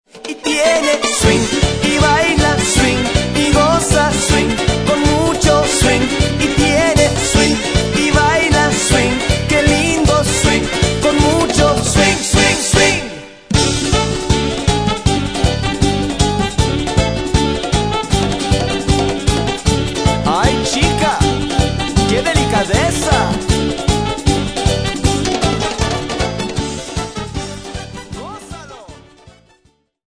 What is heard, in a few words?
Swing